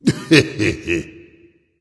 heh heh, voice; smite; voicepack; ymir

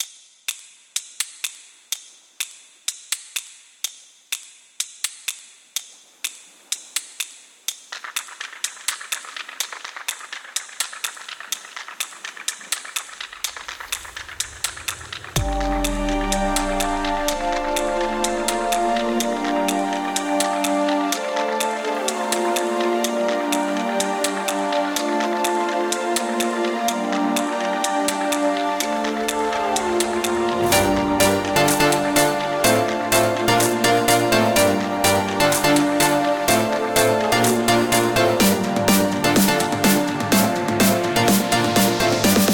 mus opening; smite

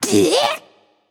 grunt attack, voicepack; smite; jorm

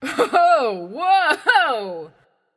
fear; Smite; athena

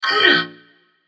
grunt hit defeat; babayaga; smite

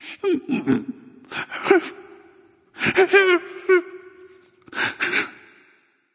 boo hoo; smite; voicepack

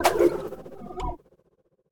Fire; Smite; god Izanami

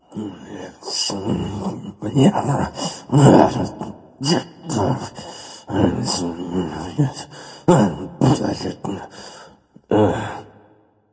grumbling; smite; voicepack; hou yi

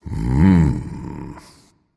content, voice; Smite; ymir skin9

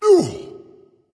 ouch, voice; smite; voicepack; ymir

